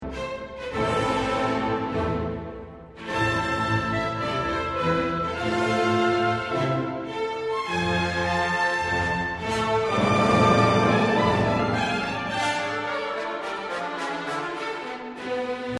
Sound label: symphony 6 allegro energico ma non troppo; mahler